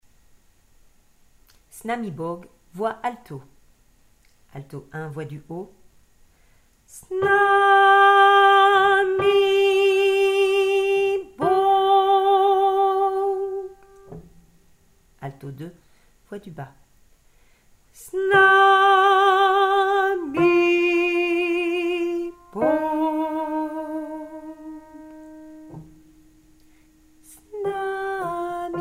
alto

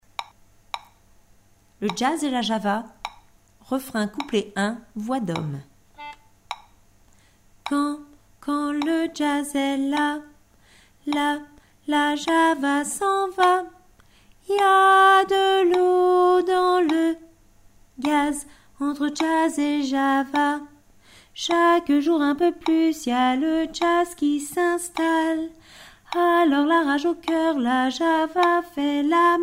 hommes

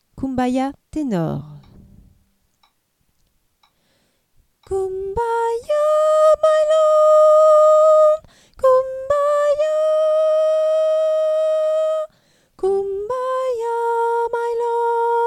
tenor

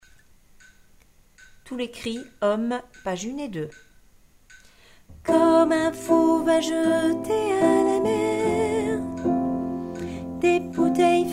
tous les cris hommes

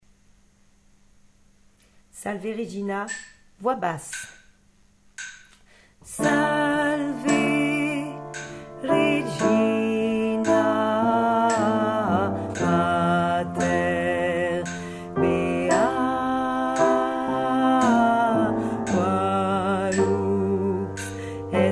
salve regina basse

